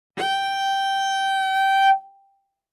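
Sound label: Cello arco fortissimo sul A Gb5; MIS Pitches - 2014; Strings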